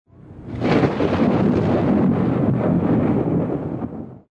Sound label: Thunder, Nature Backgrounds